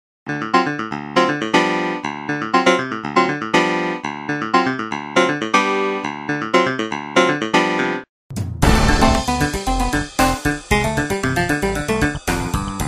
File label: hohner clavinet d6 vst plugin software vintage virtual electric; syntheway electrikeys vintage virtual electric pianos